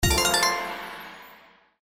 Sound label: REEL SYMBOL REGULAR, SLOTS; Magic Coin